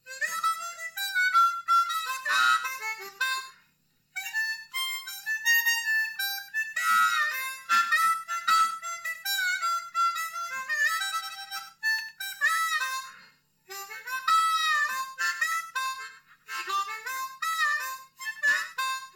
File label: Harmonica